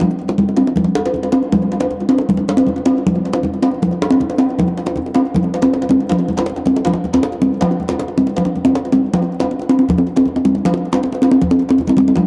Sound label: CONGA BEAT; Ethnic; Zero G - Ethnic